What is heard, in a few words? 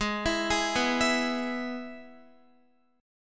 Ab Minor Sharp 5th Ab down Guitar; Abm#5 arpeggiated; JGuitar